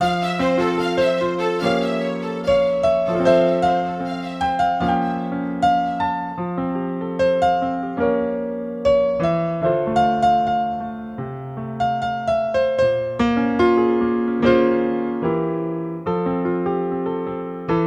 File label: Piano Solo; University of Victoria's Phoenix Theatres